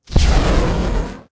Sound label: atlas dash close; Titanfall 2